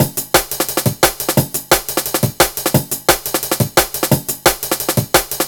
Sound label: classic dnb breaks, D, 175; Scotty